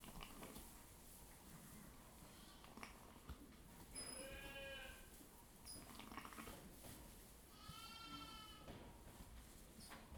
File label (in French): Moutons - Bergerie - Paille, Animal